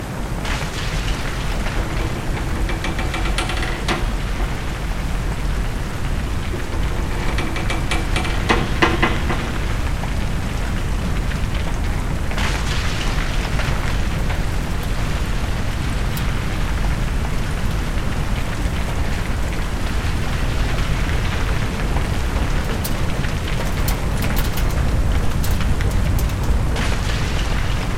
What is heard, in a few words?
collapse, ambience